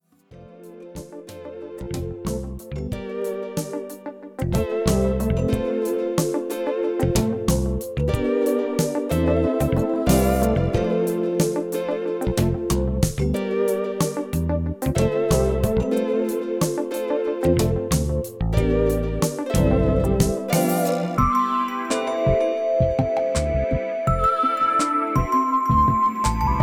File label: City Pop Sample Pack; Audiowanderer; AW Legacy Bundle